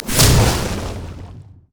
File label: arcane barrage; LoL; ironforge xerath